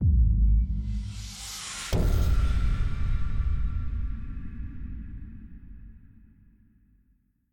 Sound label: Torch Out; The Town Intro Sequence Sam Activate; Devour 2021 gamerip pc macos